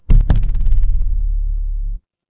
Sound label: office phone vibrate